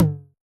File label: Tom, Yamaha DJX 2B